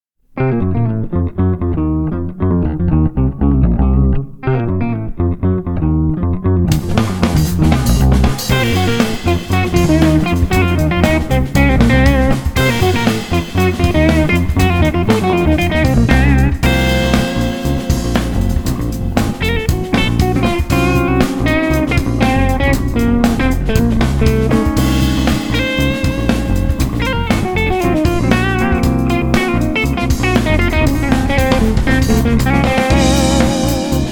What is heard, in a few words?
locrian nat 2